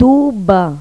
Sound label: TUBA; Pipo-Aprende musica con Pipo